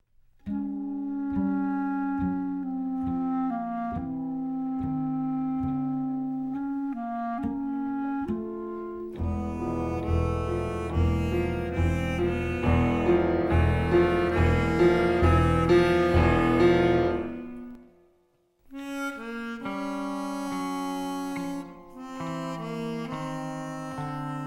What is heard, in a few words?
Blue Notes